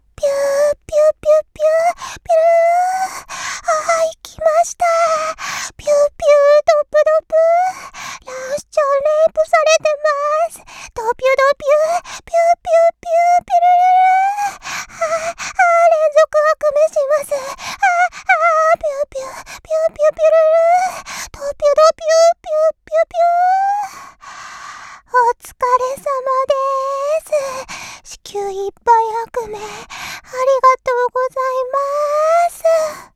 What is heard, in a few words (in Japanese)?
びゅ～～♥びゅっびゅっびゅっ♥